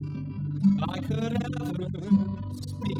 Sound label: hat